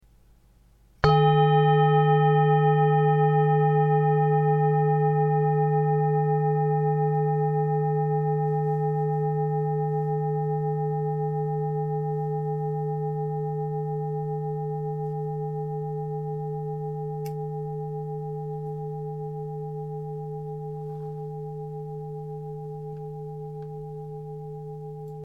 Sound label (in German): Klangschale